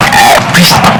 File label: ahh pss